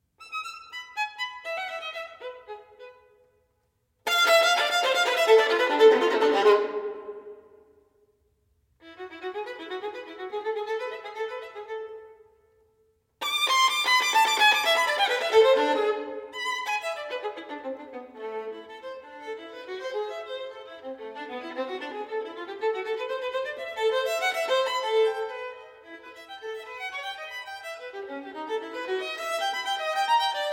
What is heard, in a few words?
Sonatas For Violin Solo, Sonata No. 2 in A Minor; I. Obsession. Prèlude; Ysaye; Op. 27